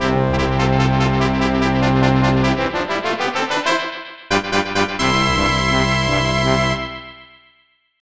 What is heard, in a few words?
Fanfare; Dragon Quest III